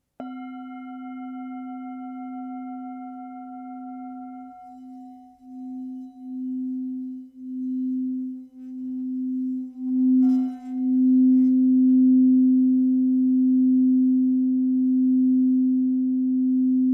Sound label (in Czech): tibetska misa